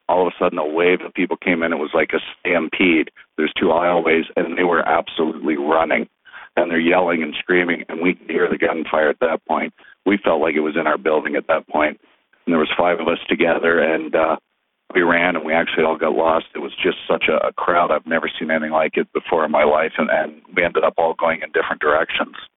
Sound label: crowd rolls in